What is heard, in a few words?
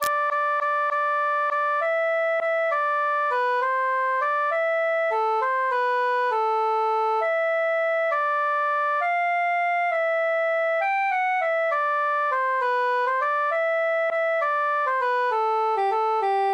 cantus solo; though philomela